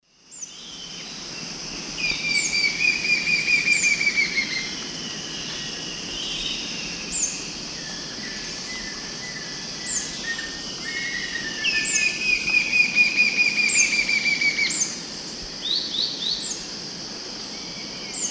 Lepidocolaptes albolineatus, song; S. America; Eastern Lowlands

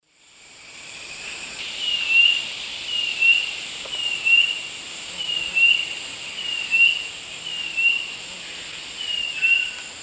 Laniocera hypopyrra, song; S. America; Eastern Lowlands

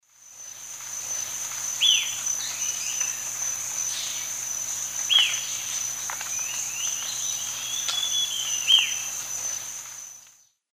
Dixiphia pipra, song or call; S. America; Eastern Lowlands

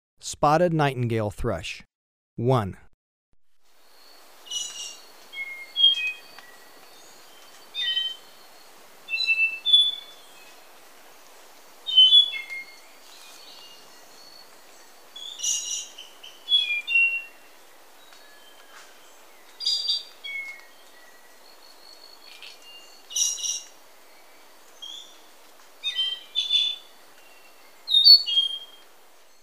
Spotted Nightingale-Thrush, Birds of Ecuador; S. America

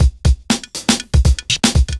chemical 120, loops, 120bpm

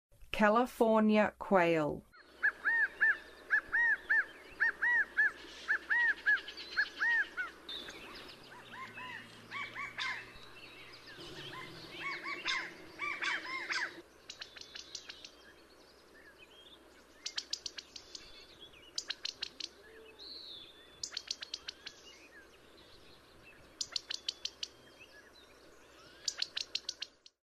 California Quail, Songs of Birds of North America

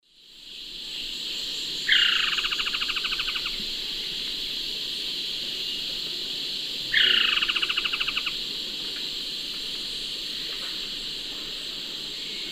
Trogon collaris, call; S. America; Eastern Lowlands

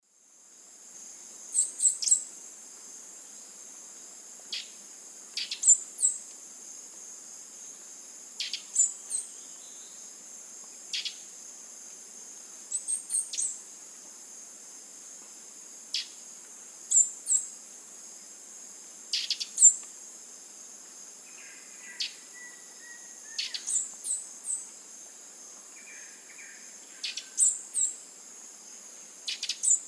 Tachyphonus surinamus, song; S. America; Eastern Lowlands

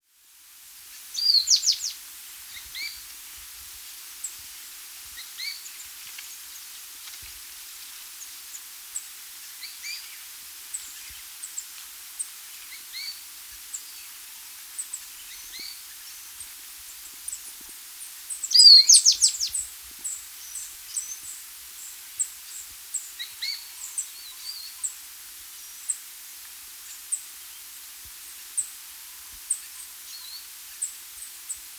Rufous-Naped Brush-Finch, Atlapetes, S. America

